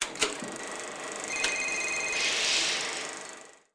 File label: theatre; gina vendetti; big t